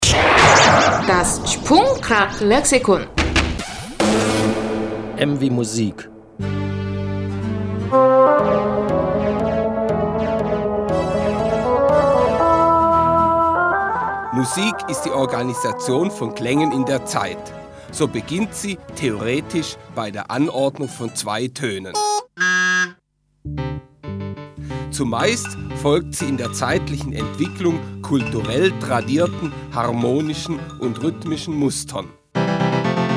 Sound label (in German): Musik